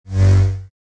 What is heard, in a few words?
lightsaber whoosh